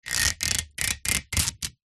industrial duct tape peel from metal

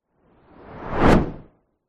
whoosh fast; sound design

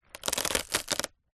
wood board large stress break splinter; foley

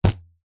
foley elastic fabric strip ping

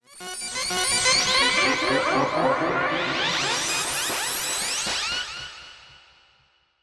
sound design mad organ pitch mod delayed

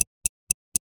multimedia tick timer loop; dustyroom

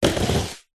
rollerblade single roll step; foley

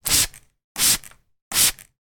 water spray bottle 3x mist sprays; household; sound effects; sound effects one

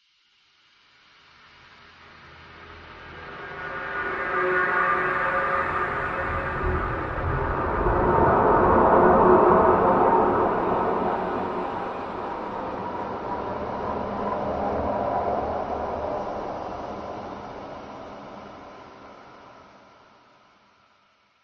paranormal horror tension swell wash; silverplatter audio

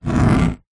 foley glass bobbled scratch fingernail; zapsplat

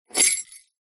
sleigh bells pick up from table

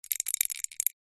foley whistle plastic toy shake rattle; zapsplat

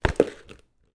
bicycle helmet down on ground; transport

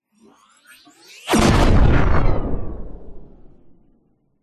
science fiction gun power up then shoot; zapsplat

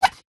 sport snooker cue chalk up squeak single; zapsplat

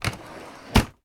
household drawer wooden open; sound effects one

